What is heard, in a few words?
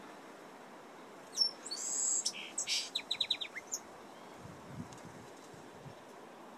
Beautiful song sparrow